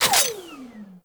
Emplacement Mech End; Grineer; Weapons

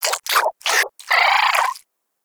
Nexifera Lure Call, Hunting Critters

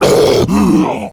Pain; Battle Barks; Warframe; Grineer Ghoul Devourer

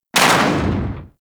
Briefcase Launcher Shot, Weapons; Warframe; Corpus

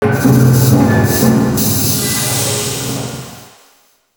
Multi Steam Jet Machine; Ambience; Warframe; Corpus Railjack

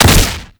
Arcade Automata Impact; Gameplay; Warframe; Nineteeen Ninety Nine; Deep Archimedia

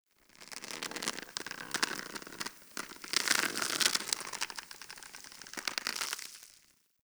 Tendon Stretch Large Close; Ambience; Warframe; Infested Eris

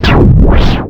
Ability Cast; Warframe; Warframes; Cast Start

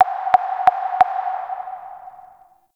Interior Orbital Station Telemetry Mid; Warframe; Tau

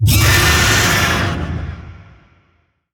Vocal Attack Short; Warframe; Zariman; Void Angel

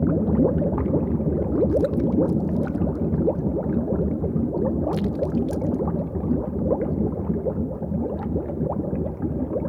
Research Tank; Ambience; Warframe; Clan Dojo

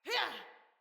Attack Female; Battle; Envoy

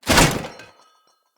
Corrupted Battle Dog Foley Land

